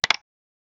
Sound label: click sound for buttons i; wild west